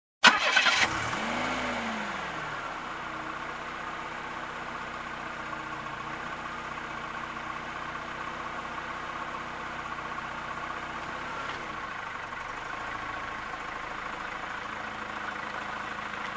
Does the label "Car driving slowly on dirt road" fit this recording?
Yes